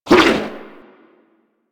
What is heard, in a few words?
Struggle; Warframe; Amalgam Override; Abilities